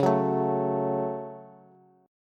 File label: Db Suspended 4th Sharp 5th Bass; strummed; JGuitar